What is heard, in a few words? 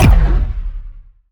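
Sci weapon Shot Medium Blaster; Power Penetrator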